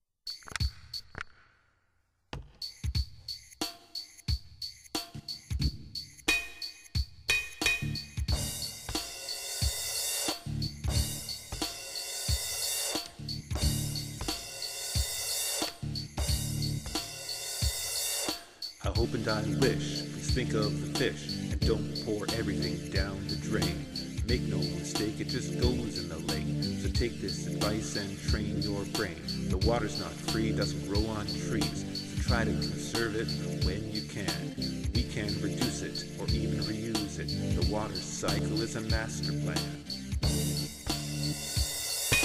rap

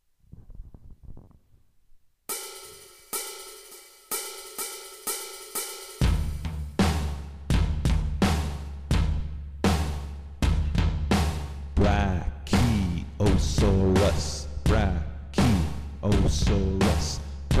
brachiosaurus song

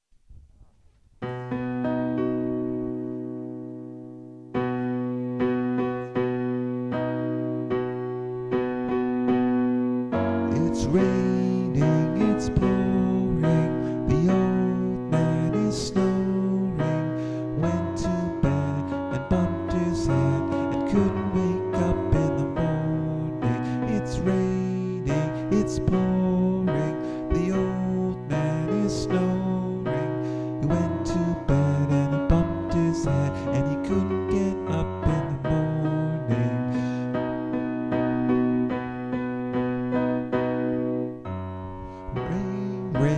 major key rain songs

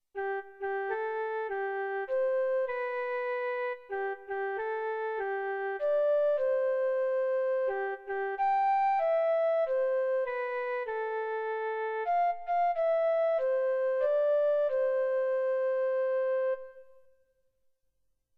flute melody

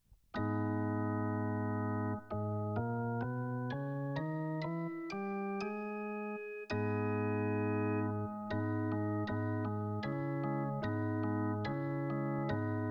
minor key medley abc black sheep twinkle twinkle

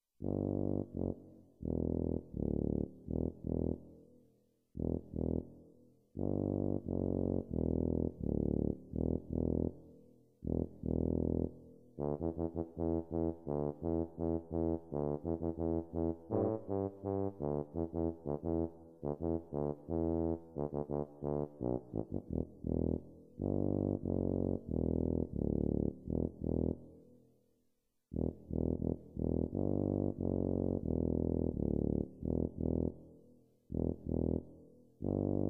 tuba melody

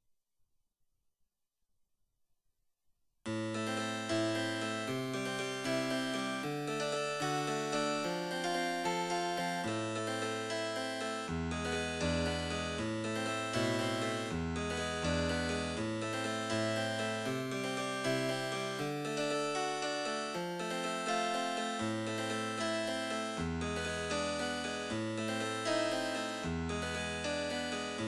harpsichord chords

